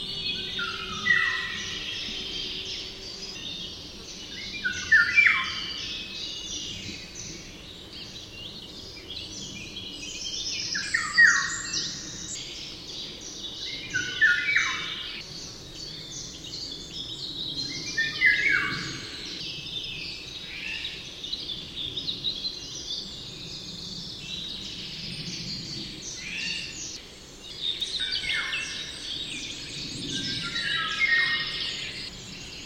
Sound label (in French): loriot d europe; chant